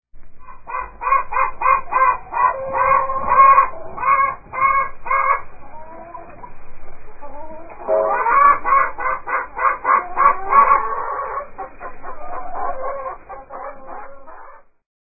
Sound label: Chickens, Answering Machine Messages